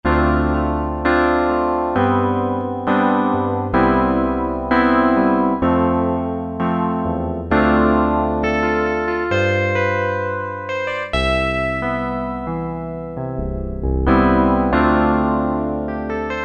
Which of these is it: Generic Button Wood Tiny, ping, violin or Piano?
Piano